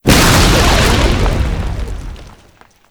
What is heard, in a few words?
tower destruction; building; damage; radiant